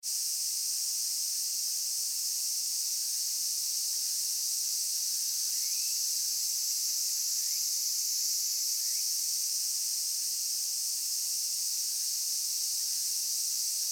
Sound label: cicada